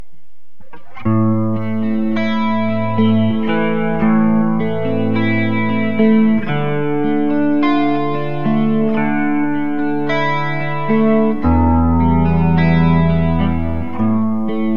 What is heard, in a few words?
dragon neck clean specpick; burny; fugly clips